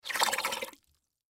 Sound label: Bubbles Gurgle, Water Bubbles Gurgle Blow Air Through Straw Surface; Water Bubbles Gurgle Blow Air Through Straw Surface Short; Gargling; Blastwave